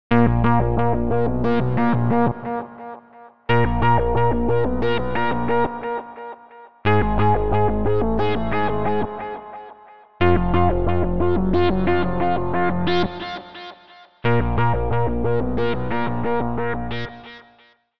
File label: accu power seq